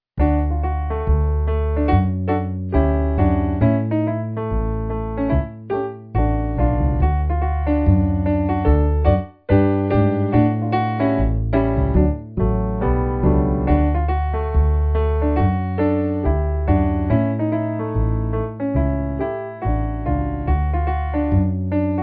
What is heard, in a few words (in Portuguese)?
playback hino